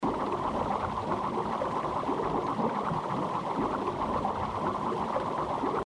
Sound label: Reel Spin; Sharks Vs Dolphins